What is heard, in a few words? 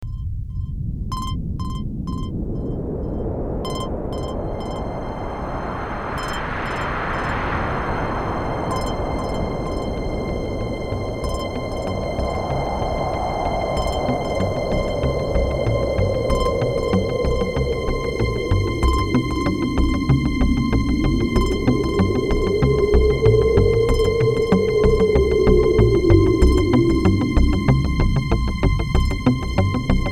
Seq Wind